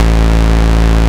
BASS L, Drum & Bass 160 BPM; Ueberschall; Drum & Bass Flash Trackz

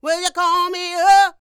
D SING, Vocal R&B Dance; DB DANCE

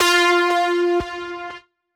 Lead - F

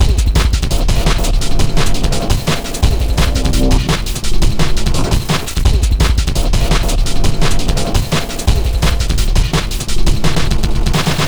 Nutto Beat, Electronic Beats; KJ Sawka; Drum n Bass & Beyond

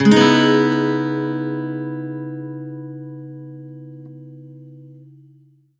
C# min9 downstroke, Acoustic Guitar; Sonic Foundry (Sony Creative Software); Songwriter Acoustic Guitar Companion; One Shot Chords

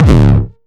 909 Dist Reso - C#2, Kicks; Mbase 11 Kicks

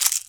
RATTLE, INDIAN PERC

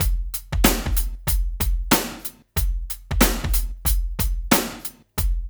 DRUMS, Loops; East West; Funky Ass Loops

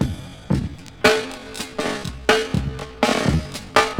streetcher, breakcore breaks

